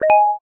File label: hit, keno; Bingo